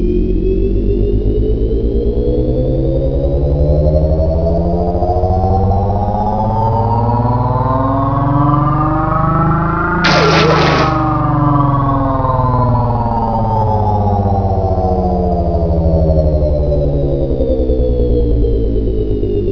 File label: equipment; items